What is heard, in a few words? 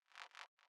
zap